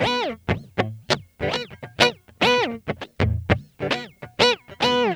GUITAR, Funky Ass Loops; East West

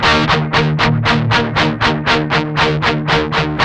8TH E, Guitars; Spectrasonics; Hans Zimmer Guitars VOL-1; 128-JACKHAMR

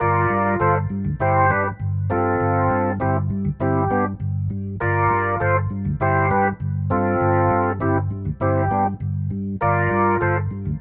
Rotor Riff, Organ

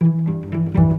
Bass Cello Pizz, Cinematic; Sonic Foundry (Sony Creative Software); Orchestral Series VOL-3

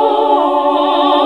SING, CLASSIC VOX1; Best Service; Hallelujah

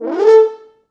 Horns Sax, FrHorns FXMOD; East West - Quantum Leap Horns Sax; 90's Sample CDs

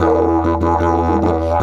E 150, DIDGE RIFFS

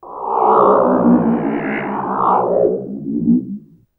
FX, Drum & Bass

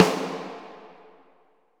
SNR SONAR, Orch Snares; Roland LCDP03 Orchestral Perc

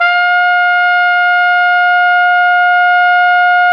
Flugelhorn; Roland L-CDX-03 Disk 2

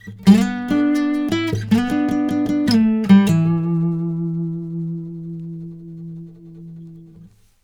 BARI LICK, Blues Guitar; ILIO; Fretworks; 96 BARI LICK

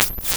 Packing Peanut Snare; Cwejman S1; DevSnd Cwejman S1 - JK

